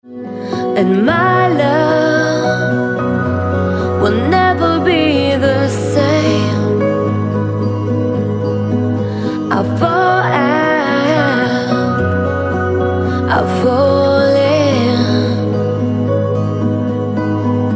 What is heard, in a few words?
Acoustic Mix